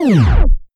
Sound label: Sweeper End